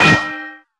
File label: pan; HeavenStudio; dog Ninja